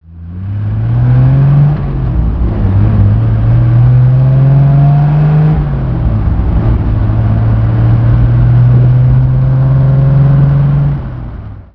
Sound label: vitesse drive